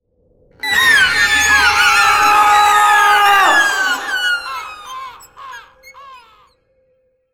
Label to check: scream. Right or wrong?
Right